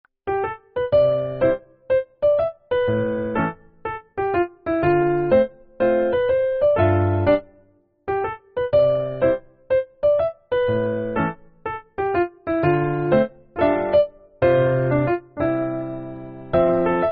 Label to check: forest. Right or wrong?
Wrong